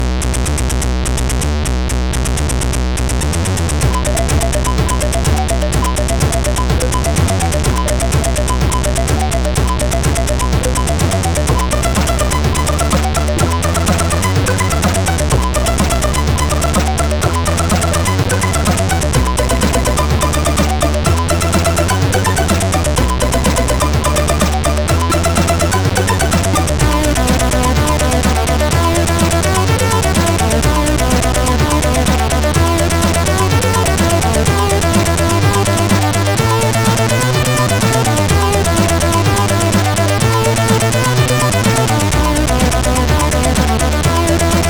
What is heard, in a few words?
Nice Introtune, CSG8580R5